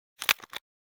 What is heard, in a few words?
camera lens; NCH Library